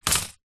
industrial tape measure plastic down on wood surface with extended metal tape; zapsplat